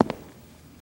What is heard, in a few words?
FS Male On Hardwood, singles wood